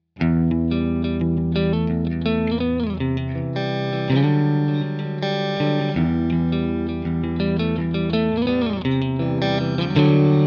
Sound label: Clean 4x12 Vintage Basketweave 2x12 Boutique Twin; Scuffham Amps S-Gear; The Wayfarer